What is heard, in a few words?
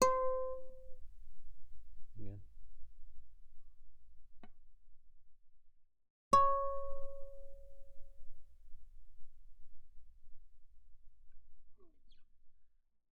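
Guitar mezzo forte sul G C5 Db5; mono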